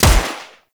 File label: Enemy Fire 3D, Weapons; Gotva Prime